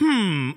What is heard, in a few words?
hmm male; ooh ahh; human